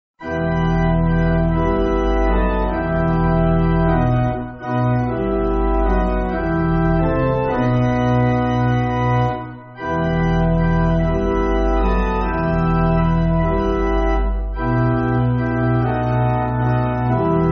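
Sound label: Pipe Organ, church music; There Are So Oppressions; Pilgrimssonger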